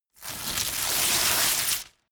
tear

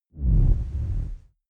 noise buzz

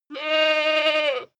goat baa high male

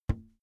balloon hit hand

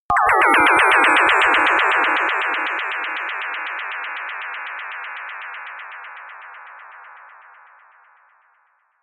atonal rhythm riser short

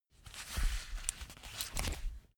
leather belt open medium

